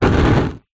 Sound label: nature fire flame air wind blow blast fast short